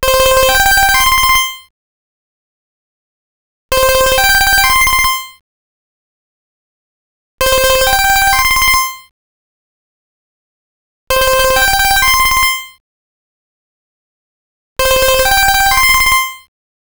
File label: Trio; PlusFourSix; Josephine (G700)